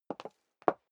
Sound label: step high heels wood parquet scuff; schritte